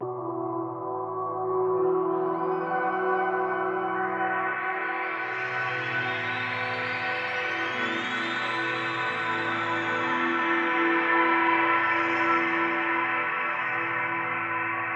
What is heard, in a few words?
Granular; Flesh Blood Concrete